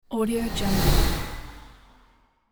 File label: spaceship by pass